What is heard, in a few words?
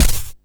Minimal Perc; Minimal Percussion